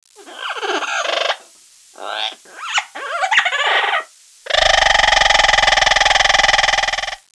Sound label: parrot